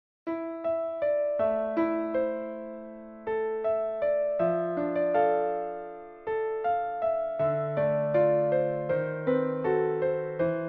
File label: DUET TRUMPET PIANO